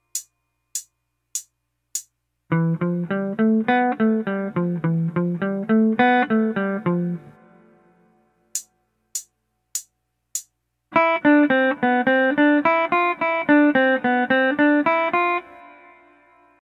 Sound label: guitar workout